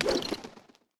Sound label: tower royal Archers skill strike